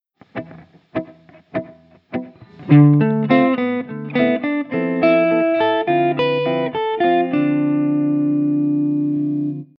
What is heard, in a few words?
dorian, guitar